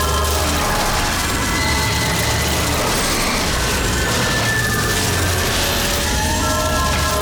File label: Soul Shackles Loop; EnemyBoss; bloom minigame